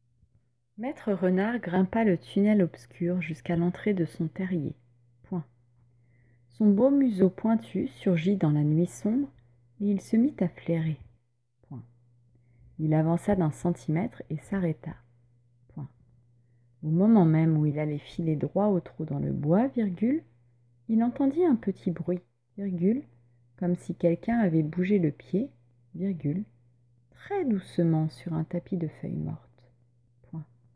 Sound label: Vocal